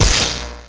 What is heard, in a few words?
electric ping